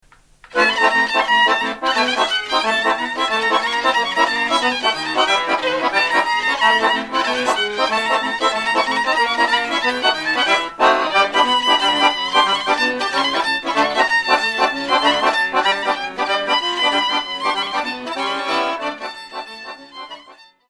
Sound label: Sirba; Roumanie